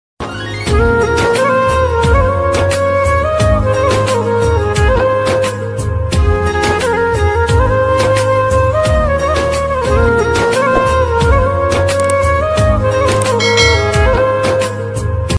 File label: new bangla sad ringtone bangla ringtone new bangla sad music; bangla koster ringtone